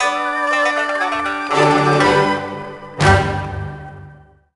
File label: Sting Combat Victory